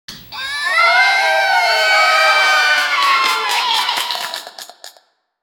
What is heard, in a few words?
casual dark fantasy level, congratulations